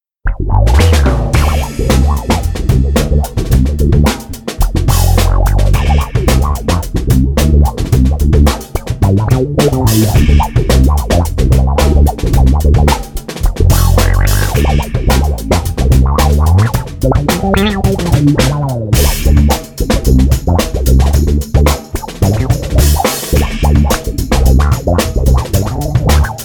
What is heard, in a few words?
me-20b patch; ME-20B Bank 9, No. 2; Funk sound suitable for finger picking.